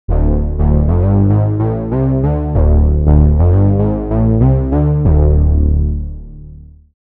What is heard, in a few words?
broad full bass; opx pro3